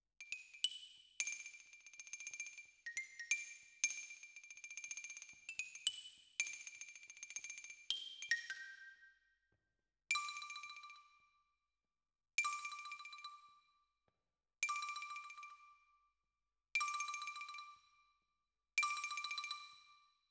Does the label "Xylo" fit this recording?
Yes